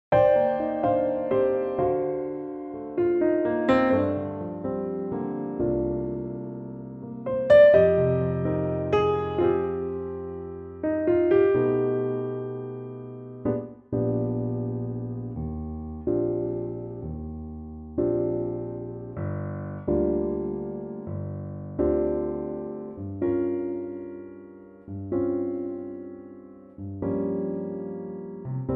Eb piano; key - Eb; Pianotrak

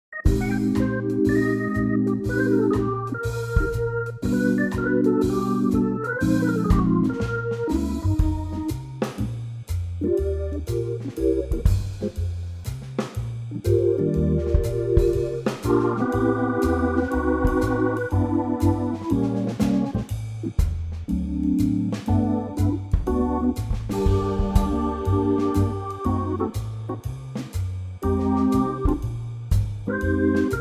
Eb organ; organ trio; vocal range - Eb to F; Super-cool Hammond organ trio arrangement of this great old standard.

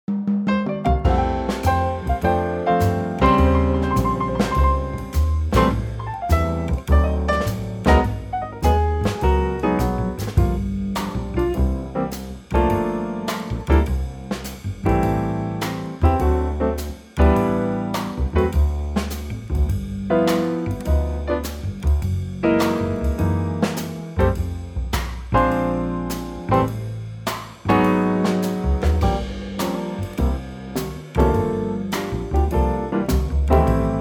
F Trio; key - F - vocal range - C to G; Moody Trio arrangement

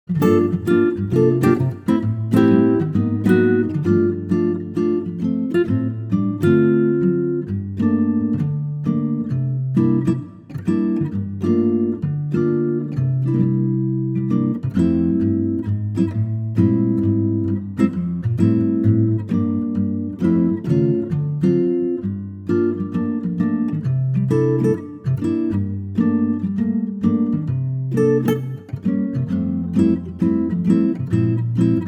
Ac Gtr; F; key - F - vocal range - A to C (optional D at the end tag); Superb acoustic guitar arrangement of the classic standard

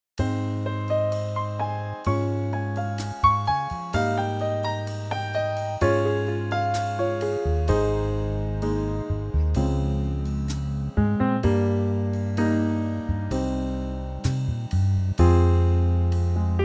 Ab Trio; key - Ab - vocal range - F to Ab (optional B)

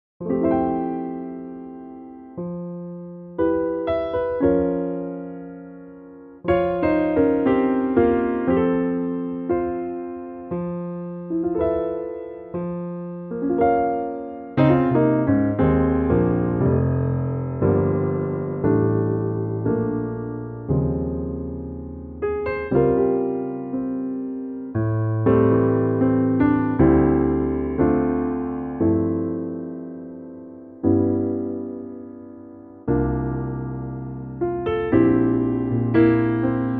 F Piano; key - F - vocal range - A to D

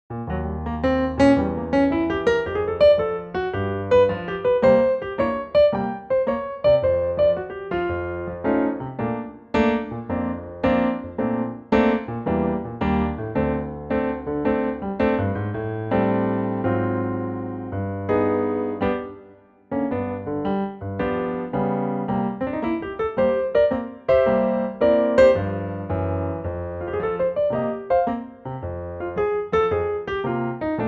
Bb piano; key - Bb - vocal range - D to Eb; Cool and lightly swinging 2024 Pianotrak arrangement

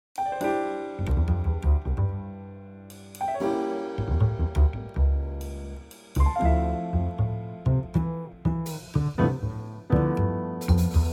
C trio 80 bpm

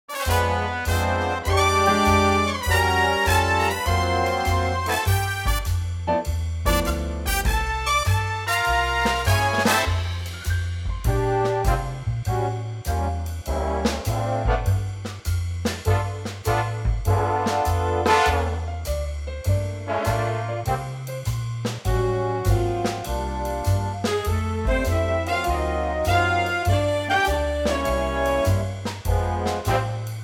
F big band

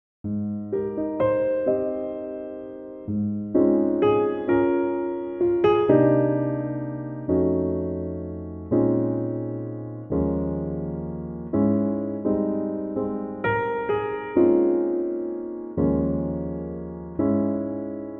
piano; key - Ab - vocal range - Eb to Ab (optional Bb); Pianotrak; Wonderful song in a lovely 2015 Pianotrak arrangement.

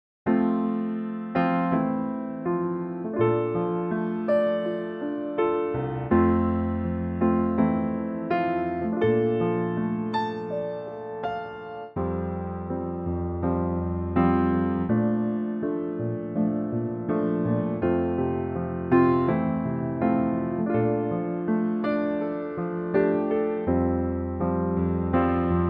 F G piano

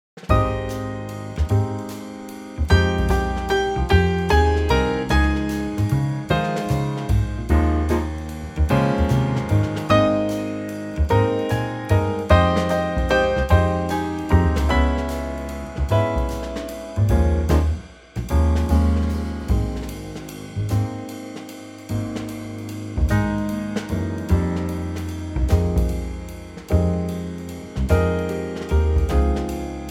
Trio; key - Ab

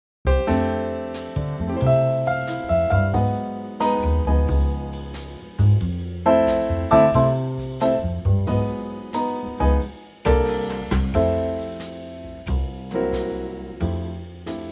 F trio

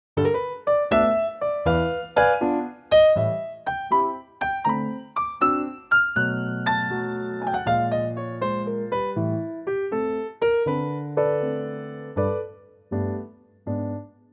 piano; G Pianotrak; key - G; range - G to A (optional B for the bluesy end!); Lightly swinging piano only arrangement

